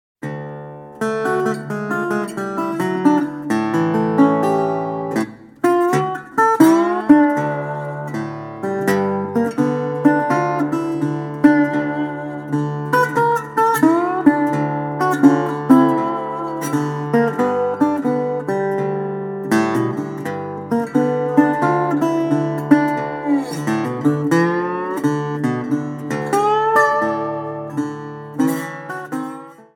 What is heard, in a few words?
Sliding St. Louis Blues, guitar